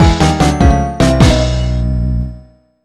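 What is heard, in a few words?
Music Jingle Ko; Monkey Madness Island Escape; NDS gamerip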